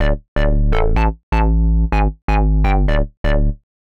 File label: 125 Hard Synth Bass; PowerFX - Nu Jazz House